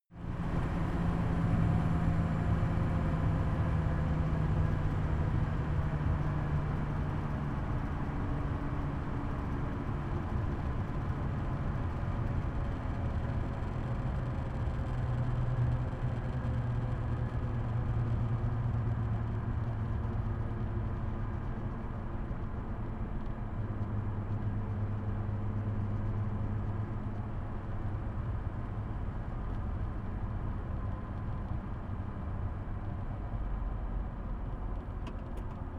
car jaguar e-type decelerate interior

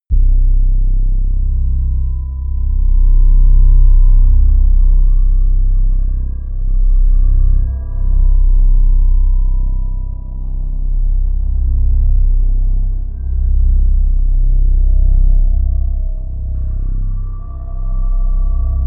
drone bass

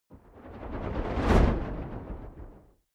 fire whoosh flutter fast; swooshes-swishes

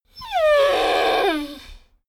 dogo argentino whine sigh; natur-tiere; hunde

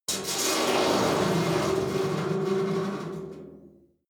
metal squeal saturated rough; industrie-wirtschaft

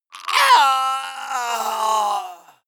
male death cry; schreie; menschen; soundpool

